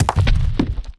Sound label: Rock Fall Land; Playable Tutorial Level